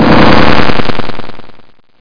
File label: hit ship